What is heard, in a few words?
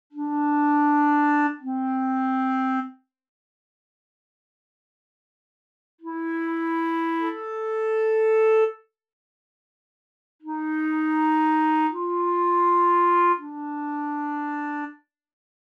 Clarinet